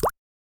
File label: Water Drop Notification; Xiaomi Redmi-8A